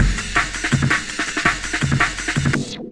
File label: breakcore breaks